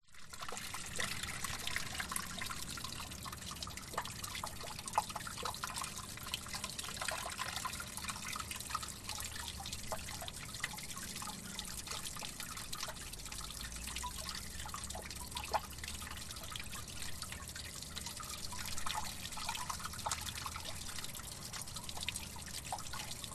industrial harbor distant drone water leak from pipe sucking stones